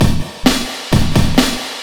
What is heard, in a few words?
drum loops; bb drum loops